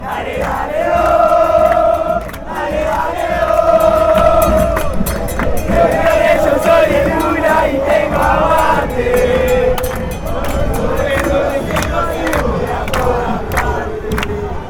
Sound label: douglas haig chant